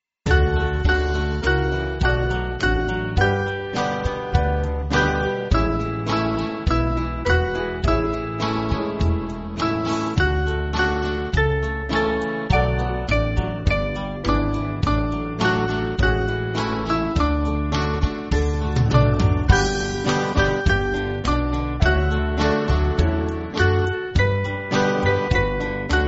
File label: Make Me A Captive Lord, band; Leominster